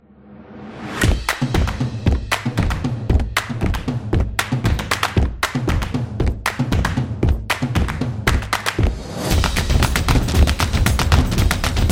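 Fun Action, Street percussion background music built for sport, motion, and dance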